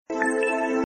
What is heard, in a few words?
Note SMS